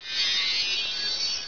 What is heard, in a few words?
libro out